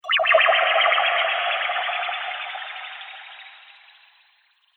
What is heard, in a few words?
Wizard Far Away Spell; Magic Sound Effects